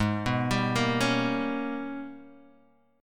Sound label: Ab Minor Major 11th Ab, Guitar Half Step Down